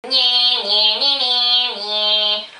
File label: twang